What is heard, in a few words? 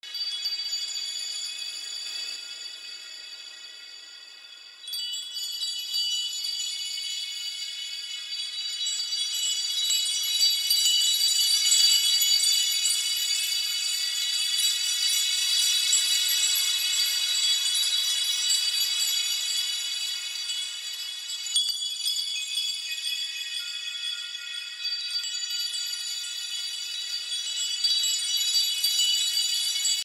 chimes crystal shards echo